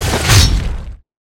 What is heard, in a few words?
attack; Raymond